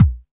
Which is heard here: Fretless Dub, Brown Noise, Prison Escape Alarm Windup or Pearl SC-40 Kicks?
Pearl SC-40 Kicks